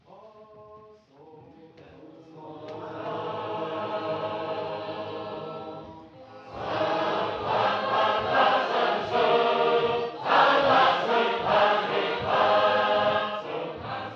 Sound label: Concord, sacred harp